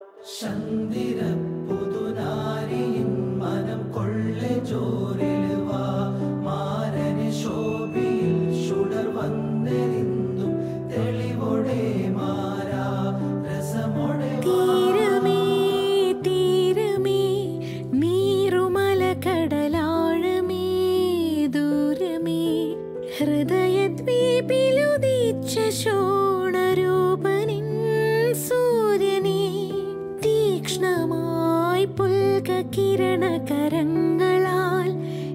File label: Male Ringtone